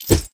flail

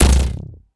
bird arrow

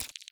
ui blink

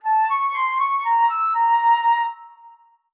flute attack

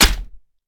atk sword

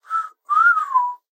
whistle unfamiliar

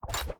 atk shield

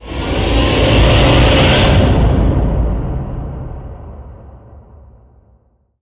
jumpscare

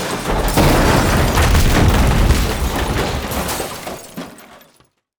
equipment collapse

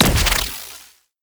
fire spear

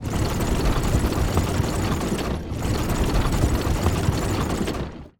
turn rudder

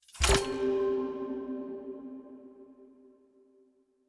celestial globe on

